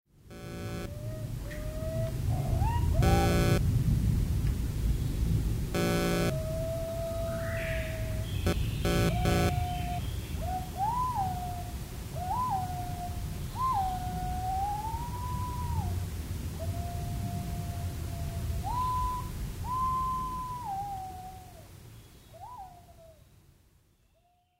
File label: noise